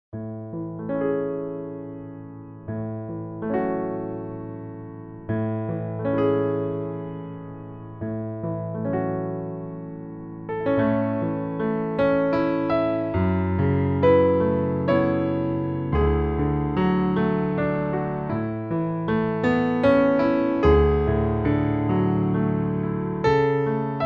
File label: A, piano